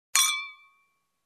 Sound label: Clinking Wine Glasses